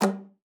KLPA G3, ALTOSAX; Xsample VOL-2

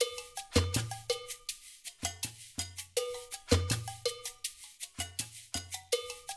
percussion loop

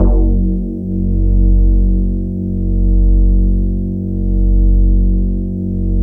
E HARP R, DX HARP

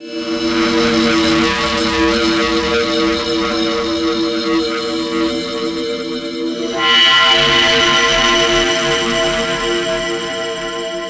Distor Pad; KJ Sawka; Drum n Bass & Beyond

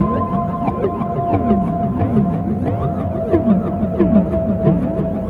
Ambi Groove

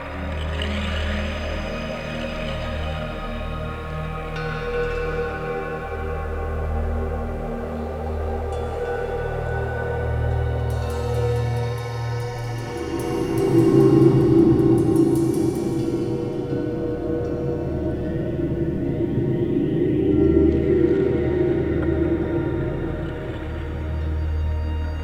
Synvoxperc Scape; Sonic Foundry (Sony Creative Software); Ma Ja Le; Soundworlds

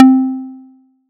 SY TAKBAS; ModelCycles

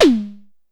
Drum X Tom; Pearl Drum-X

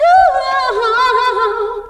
RAVE

